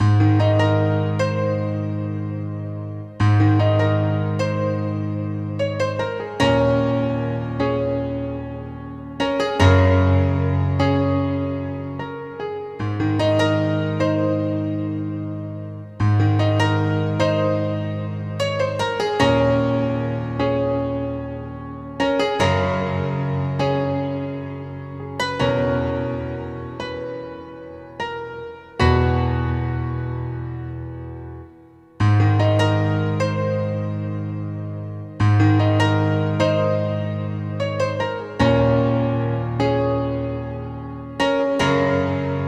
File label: game over